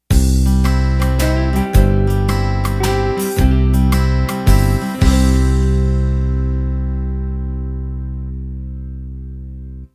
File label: Country 3-4 End